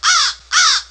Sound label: crow